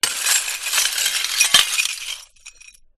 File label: Glass Shards Rummage Scrape Long Drag Hit Large Shard; skyes audio